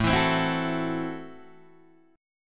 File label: A Minor 6th A Guitar DADGAD